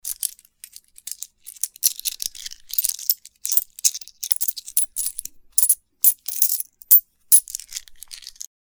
coins strumming

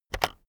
keyboard external enter press very fast

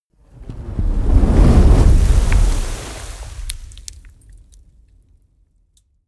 whoosh fire ball crackle large

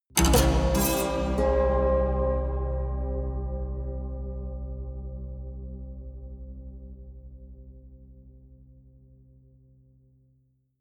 haunted strings dark omen heavy

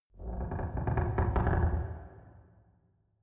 church creaks

